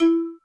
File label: Xylimba, Alesis D4, Drum Machines